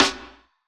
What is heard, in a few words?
90s hiphop snare; Reference beat bpm90-A